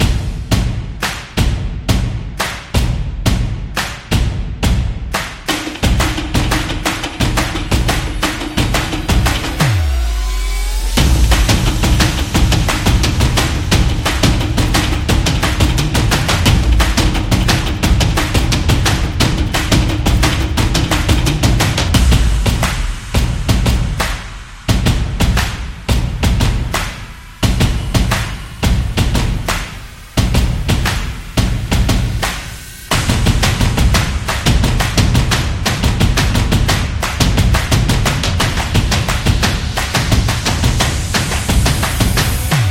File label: Drums Trailer